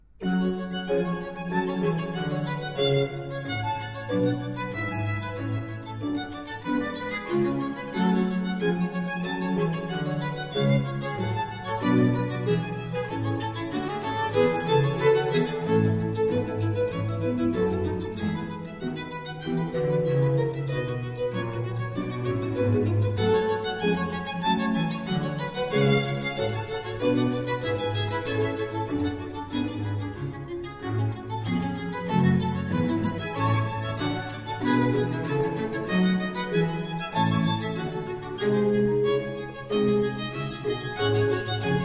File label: Sonata No. 2 in G minor allegro; Haendel; Sonate a trio